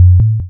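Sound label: waveform FM sine triangle envelope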